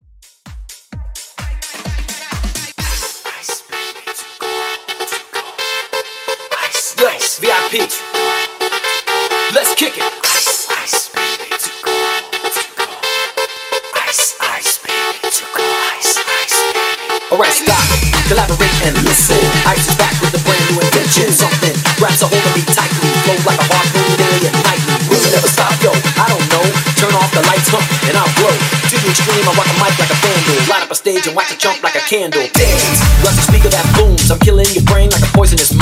mashup tech house 129 bpm

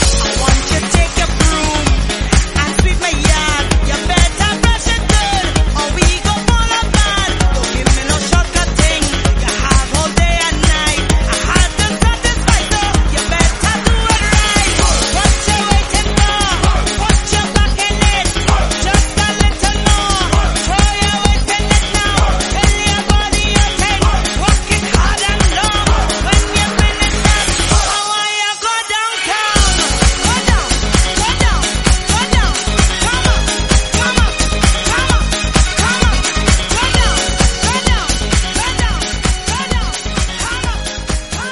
Disco 130 bpm Abm

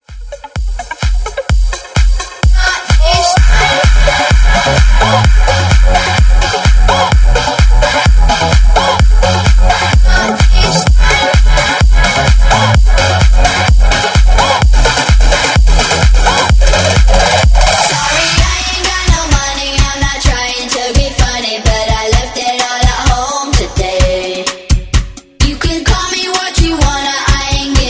128 BREAKMIX MASHUP

